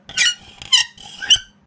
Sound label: Valve Spin; hello neighbor pre-alpha alpha 1